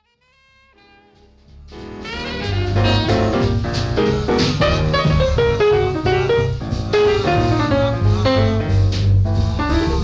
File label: blues in bebop